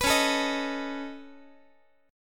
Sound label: C sharp 7th Suspended 2nd Sharp 5th C sharp down Guitar; C#7sus2#5; strummed; C#7sus2#5 Chord; JGuitar